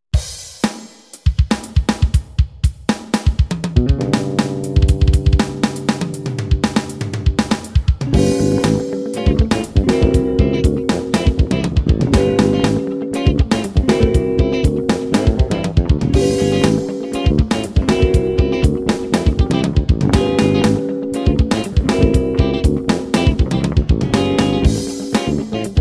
funk

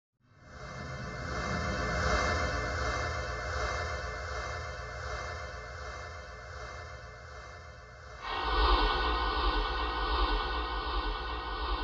ambiance attic; the joy of creation collection

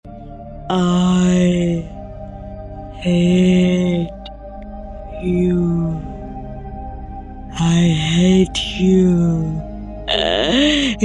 creepy girl singing i hate you scary horror voice

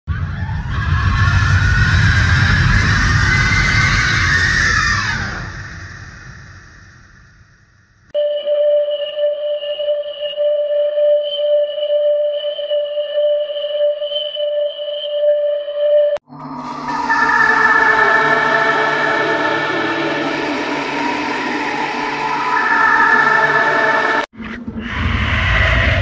scary sounds creepy sound horror sounds

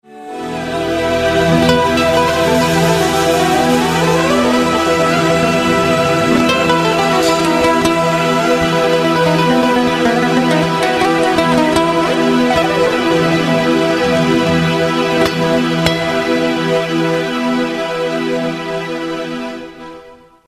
classic flamenco

